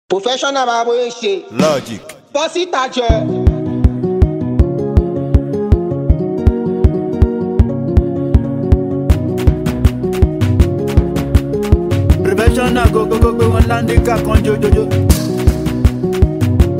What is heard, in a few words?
Professional Beat